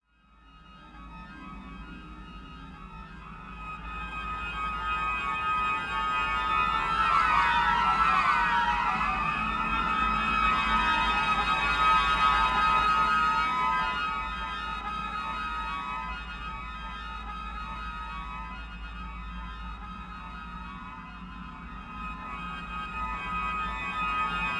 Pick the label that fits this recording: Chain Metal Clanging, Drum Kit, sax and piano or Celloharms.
Celloharms